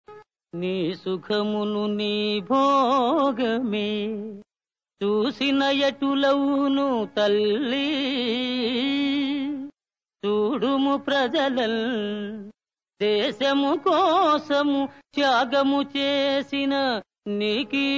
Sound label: Padyam; Appu-Chesi-Pappu-Kudu(1959); NeesukhamunuNeeBhogame